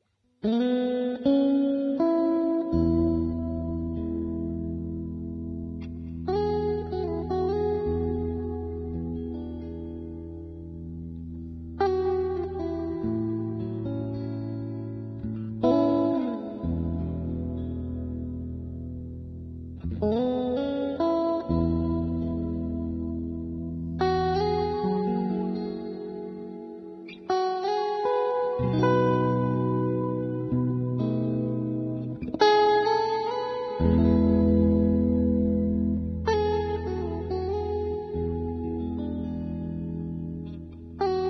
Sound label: Guitar Electric; Zomboid; Lifestyle